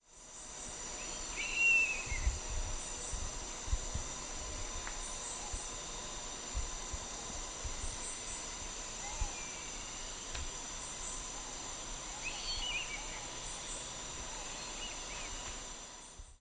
Spilornis holospilus, Filippijnse slangenarend; roofvogels; Accipitrinae; Accipitres; Accipitidae

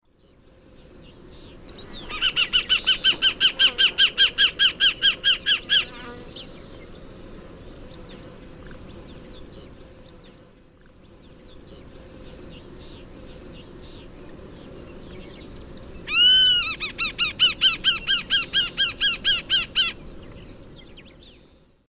Geranoaetus polyosoma, Roodrugbuizerd; roofvogels; Accipitrinae; Accipitres; Accipitidae

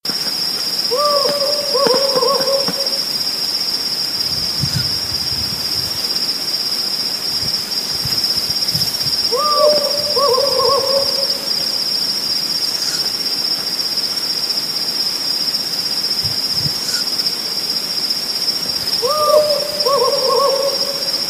Strix hadorami, Palestijnse bosuil; uilen; Strigidae

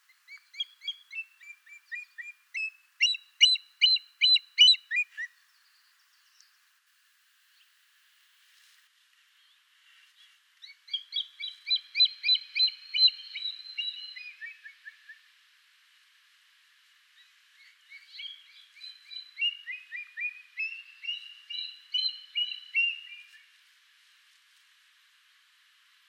Buteogallus anthracinus; Mexicaanse zwartebuizerd; roofvogels; Accipitrinae; Accipitres; Accipitidae

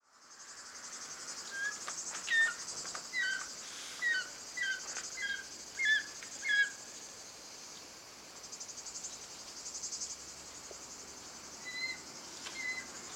Circaetus gallicus, Slangenarend; roofvogels; Accipitrinae; Accipitres; Accipitidae

